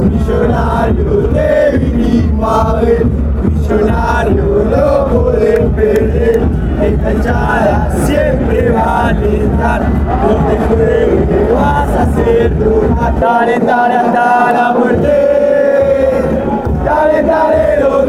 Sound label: cheer you on until death, fanchants, argentina